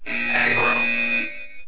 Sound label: blare aggro